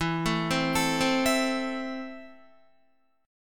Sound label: E Suspended 4th Sharp 5th, Guitar; arpeggiated; JGuitar